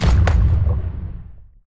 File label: WEAPONS Flak Gun